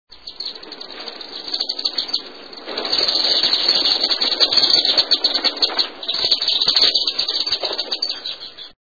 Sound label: Chimney Swift